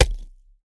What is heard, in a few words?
elemental rock impact small tight; soundmorph